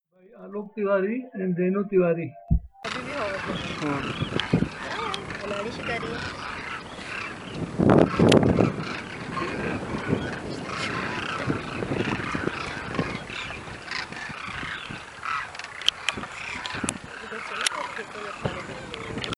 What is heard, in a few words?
Demoiselle crane in flight call; alok